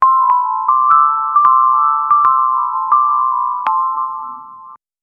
soft heartwarming tone

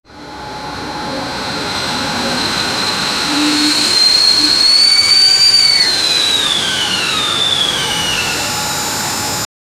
a steam engine waits

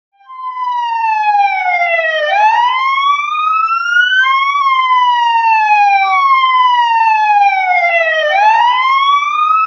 police car siren

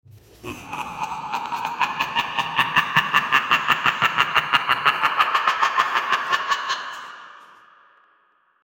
crazy hellish demon laugh

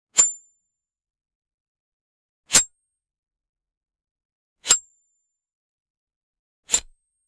the sharp twang of a crossbow being fired; projectiles

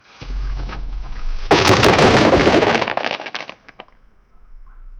heavy objects fallin

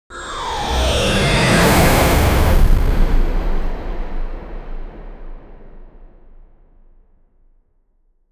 evil epic energy ball, spell

